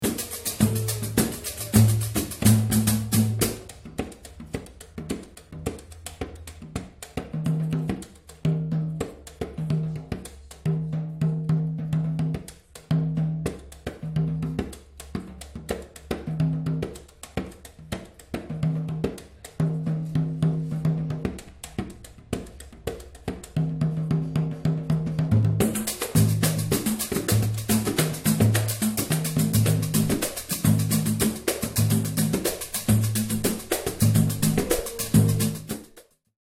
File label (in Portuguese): repique de anel